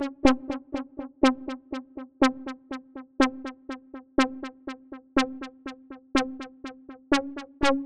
Repro-1

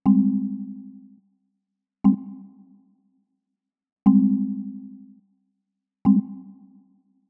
Chromaphone

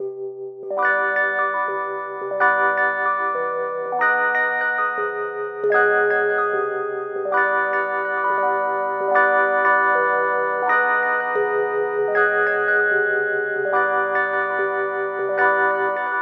Kontakt 5

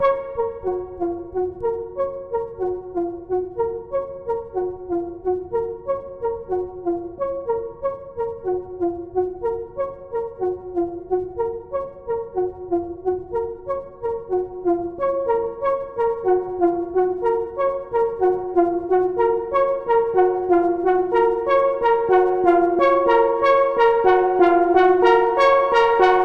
synth; synth1

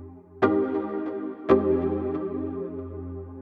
Electric88 Stereo

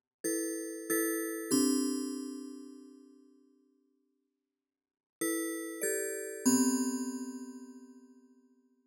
Chromaphone

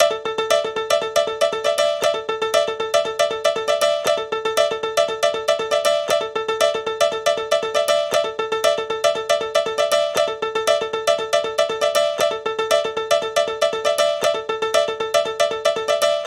Freeze Piano Percussion

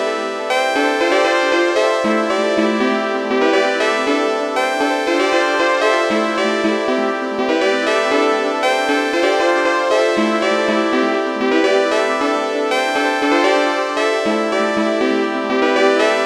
Bazille

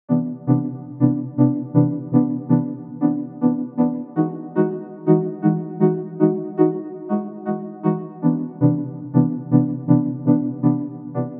Instrument Rack

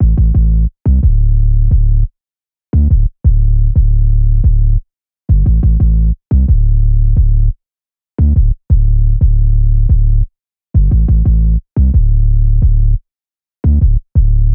808 A bang; LL 808 A bang

